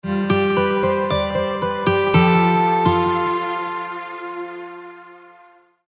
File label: Fabric XL; Tenderness